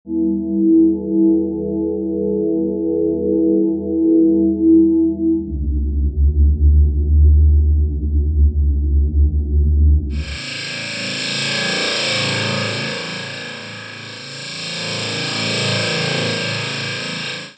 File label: drone; archeology